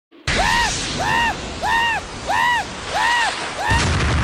wah wah wah wah wa kaboom; tfs krilln

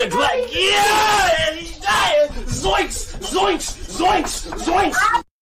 yeeeeaaaa zoinks

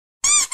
boop nose

